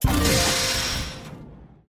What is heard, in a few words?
Enter; Thunder Fighter